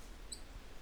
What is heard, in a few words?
Call B Cheep, titi monkeys; vocalisations; compcog